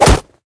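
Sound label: hit light abrupt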